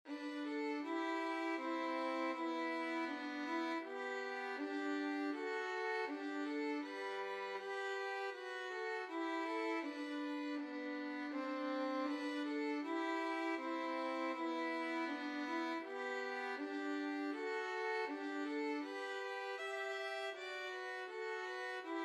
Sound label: the parting glass, violin duet